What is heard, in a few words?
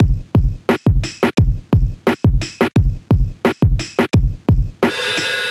Kit 87 bpm, hip-hop drums; Golden Era; MIDI Clips; Sound Oracle